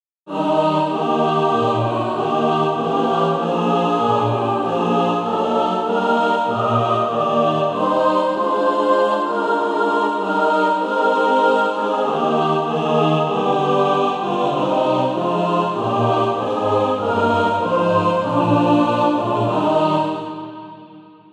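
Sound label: mixed, Practise Music; Fling Out the Banner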